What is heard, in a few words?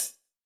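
Closed Hat; Zero-G Sound Sense Grime Scene; GS Construction Kits; Just Flex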